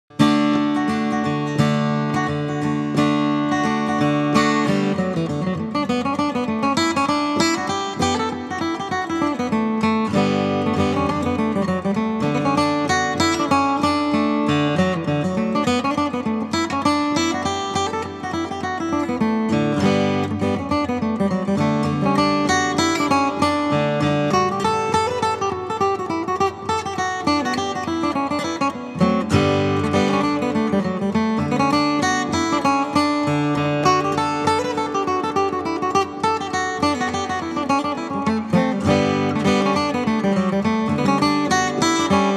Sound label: Hornpipe